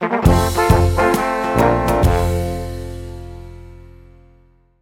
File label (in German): Jingle; Kliniken Suedostbayern; Audiologo